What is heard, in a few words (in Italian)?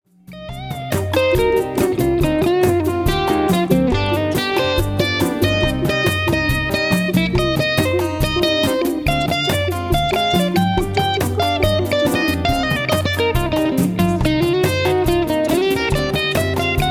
chitarra